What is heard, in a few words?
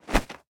punch miss; gmod; arccw uc commons attachments